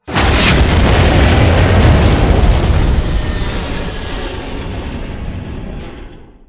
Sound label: large, Explosion; NanoFXv2; ExplosionFX; ExpLarge